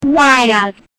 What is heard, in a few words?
short clean puzzle game s